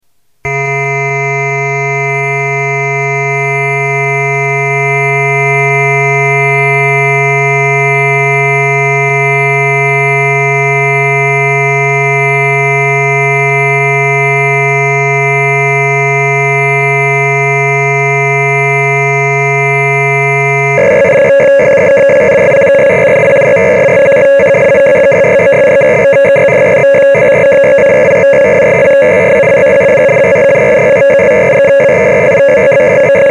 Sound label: prophet 5 factory